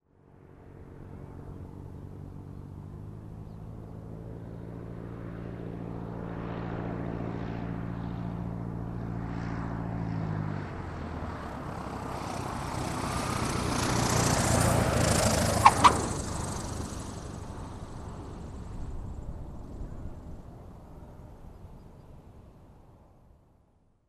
Light Aircraft Approaching Hangars

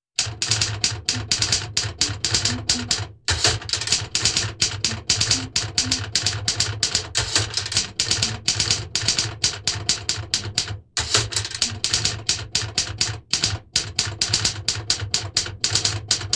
Rhythmic Typewriter

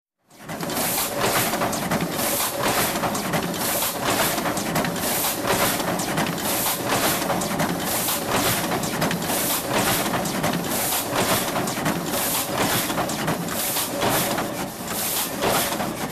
Commercial Printer running

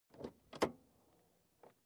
Opening glovebox

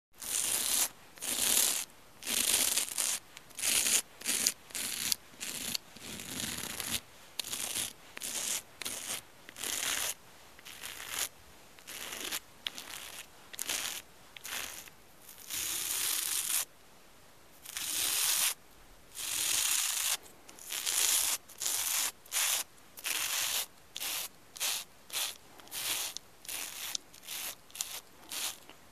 Unwrapping a box

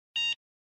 Machine Beep

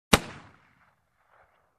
Pistol shot from greater distance

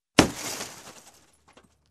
Car window destroyed with hammer

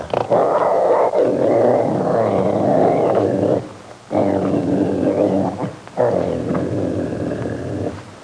Generic growling sound

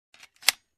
Pistol cocking

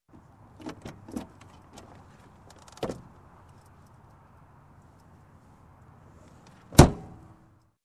Opening and Closing Car Door

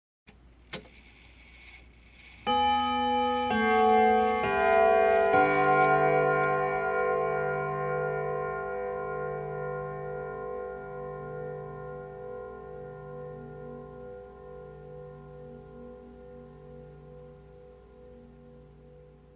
Grandfather clock single alert

